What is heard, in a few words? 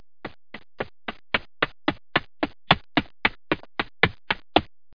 step